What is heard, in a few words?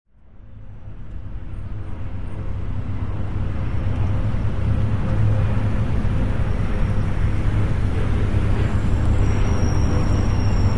Airport Traffic